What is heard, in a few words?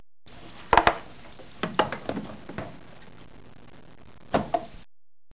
defib